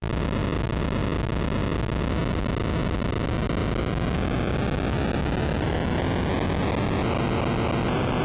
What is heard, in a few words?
Distort